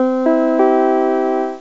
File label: gong; metro